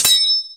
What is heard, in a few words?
KLINK, INDUSTRIAL